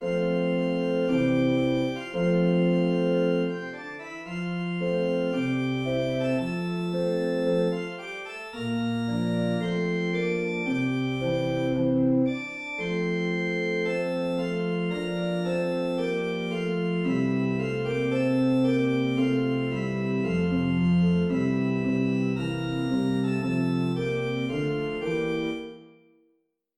Brudmarsch

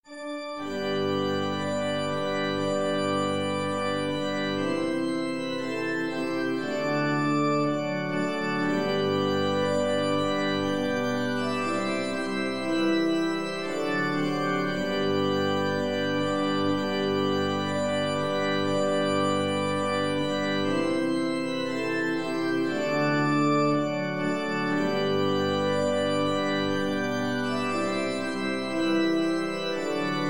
Kyrkmarsch i G-dur

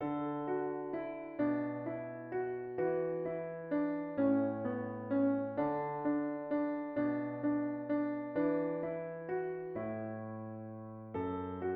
Det gåtfulla folket in D piano

